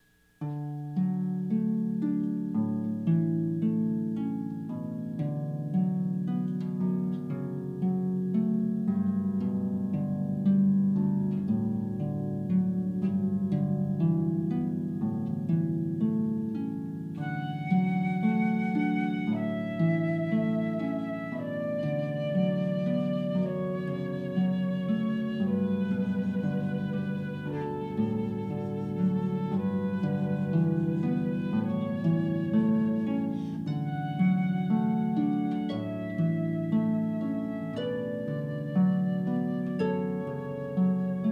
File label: Harp & Flute; Pachelbel; Canon in D